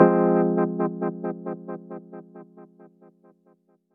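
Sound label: Fm chord; Zaixorz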